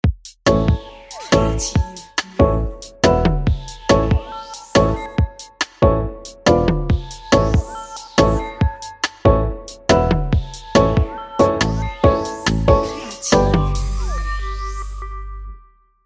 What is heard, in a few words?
Spaceship Cocktail electronic